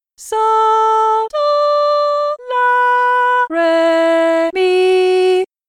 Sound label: Hard Melody Solfa; Hard Melody 1